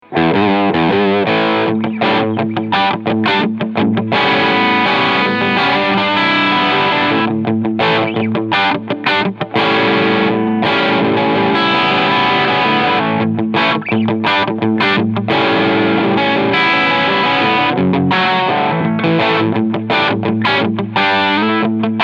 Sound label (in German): Orange Rocker 15 Terror Treble OFF Mid FULL Bass 8 Uhr Gain 2 Uhr